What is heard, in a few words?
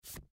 Envelope Slide; Paper; Office; Blastwave